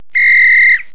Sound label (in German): pfiff; wintron